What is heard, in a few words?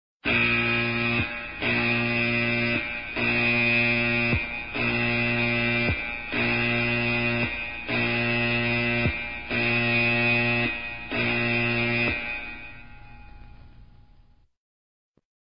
Buzzer